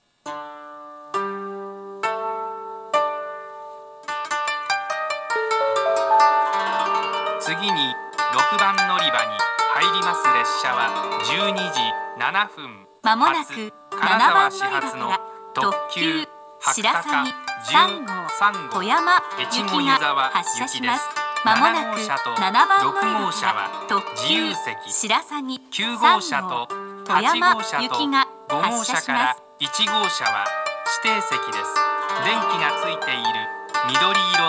kanazawa limited express; shirasagi3; toyama; jr-west

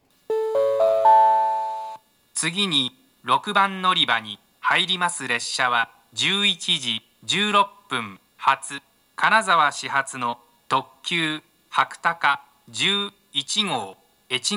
kanazawa limited express; hakutaka11; echigo-yuzawa; jr-west

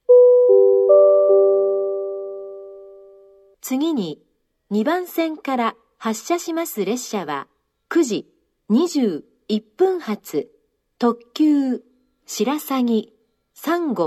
limited express, station; jr central; ogaki; shirasagi3; kanazawa

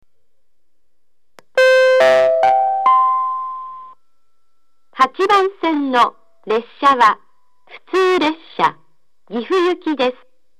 toyohashi local gifu; station; jr-central

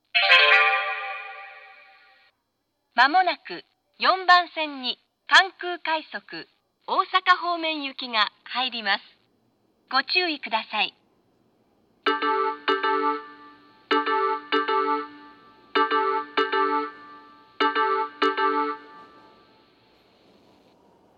rapid; jr-west; kansai-airport; kansai-airport-rapid; for-osaka

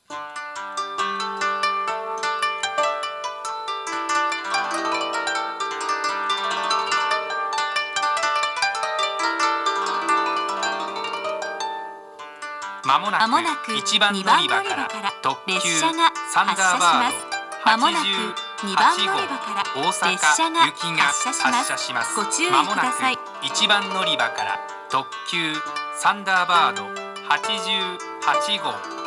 kanazawa limited express; thunderbird88; osaka; jr-west

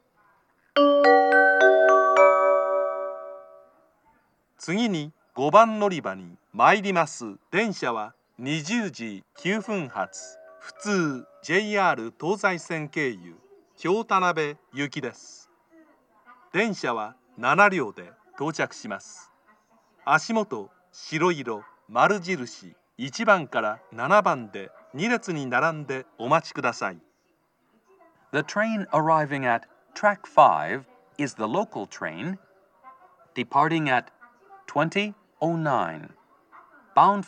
amagasaki local kyotanabe; station; jr-west

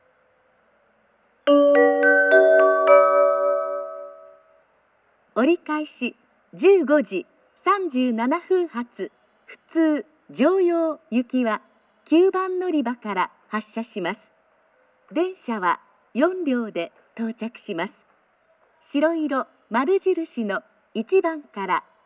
local, kyoto station; joyo; jr-west

